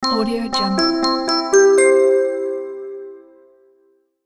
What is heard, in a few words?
notification harp chime; interface sounds